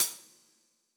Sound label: Hi-Hat Heavy, Electronic Drum Samples; Hi-Hats; E-Punk Project Drum Samples